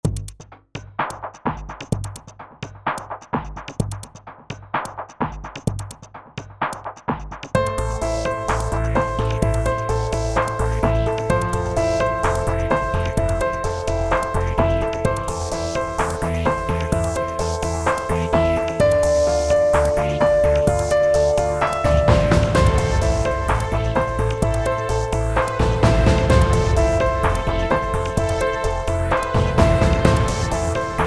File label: Epic Goal